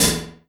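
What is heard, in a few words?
HH, hi-hat; Sonic Foundry (Sony Creative Software); Esoterik Beatz; Cricket; Criket Kit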